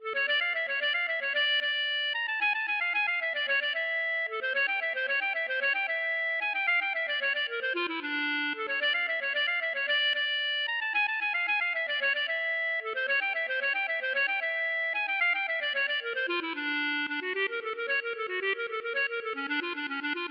klezmer

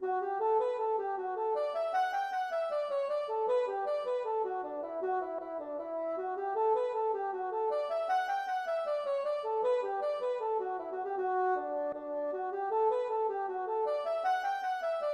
hornpipe

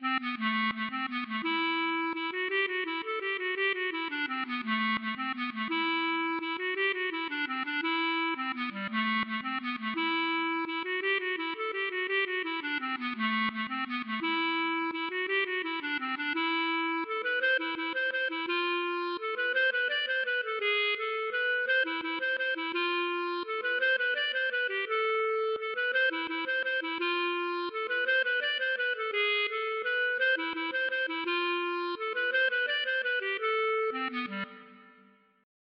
mazurka

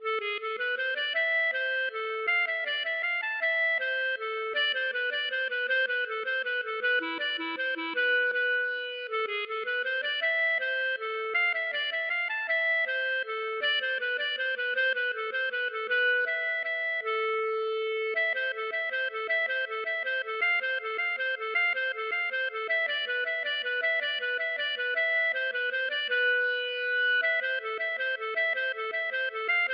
mazurka

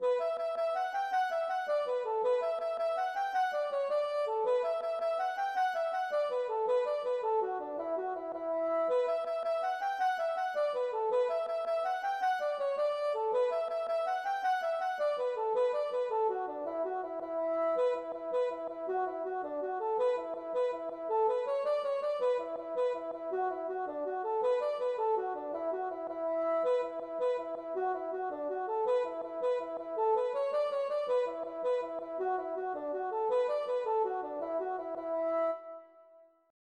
jig

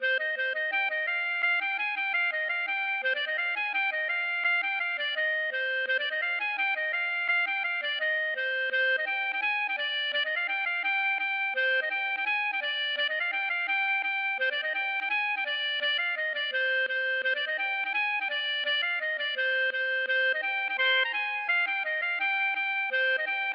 gavotte; Suite Dardoup

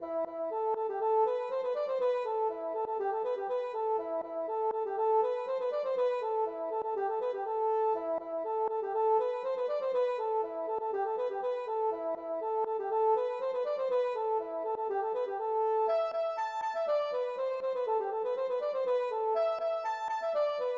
polka

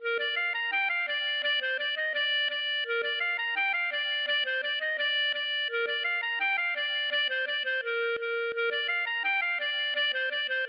gavotte; Suite Rachel

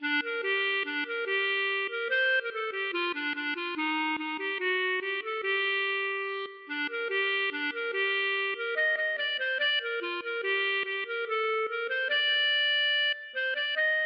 klezmer

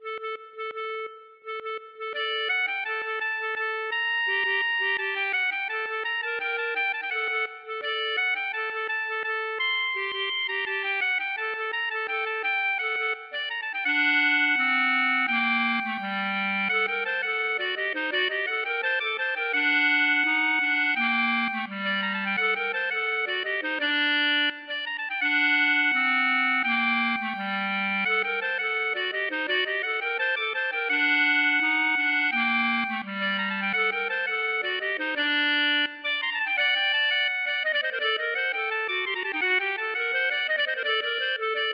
klezmer

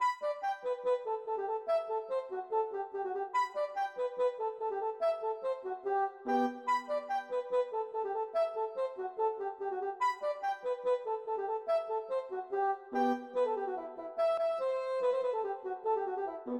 polka

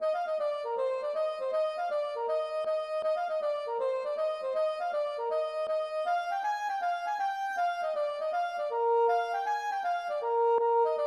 gavotte; Huelgoat

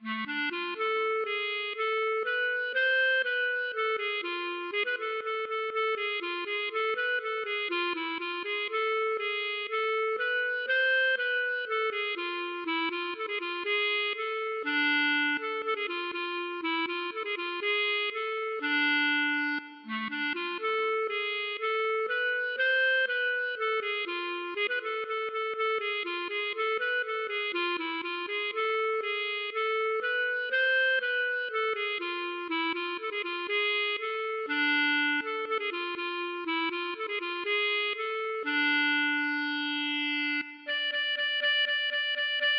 klezmer

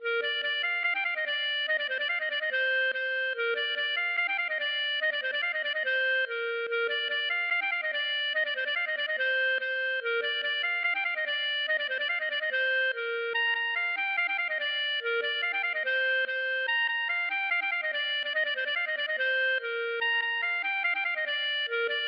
gavotte; Pourlet Ar Soner

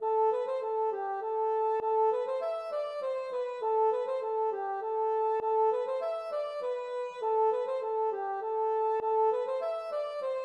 hanterdro

